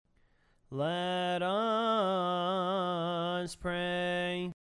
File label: let us pray